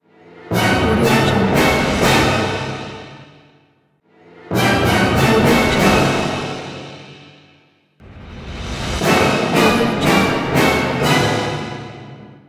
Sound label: unexpected twist